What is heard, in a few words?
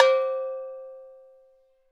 AF AGOG, Agogo; NorthStar - Global Instruments VOL-2